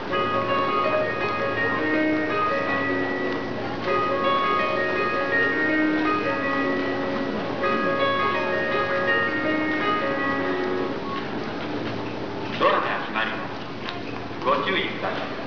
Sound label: shinjuku melody; 13番線（山手外）発車メロディ; 「ピアノの速い音節と鈴」; jreast; yamanote